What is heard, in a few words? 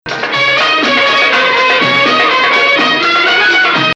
Bayati Octave